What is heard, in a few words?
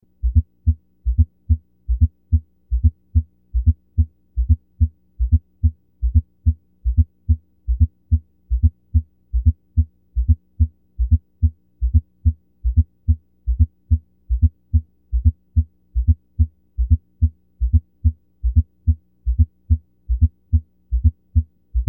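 Apex, S4, Left Lateral Decubitus, Bell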